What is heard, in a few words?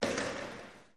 Ice Hockey Hits Impac